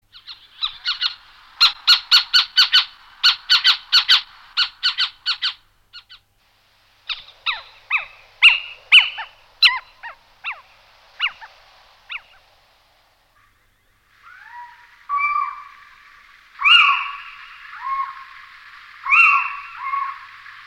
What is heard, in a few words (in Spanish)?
Mochuelo comun, pajaros